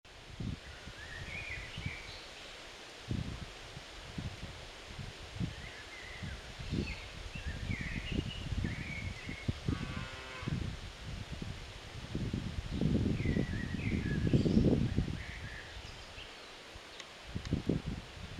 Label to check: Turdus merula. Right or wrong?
Right